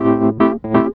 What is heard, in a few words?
E.PIANO, KEYS